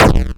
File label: 8bit 2 land; Golden Crayon Sounds